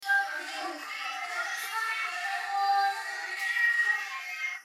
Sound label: vocals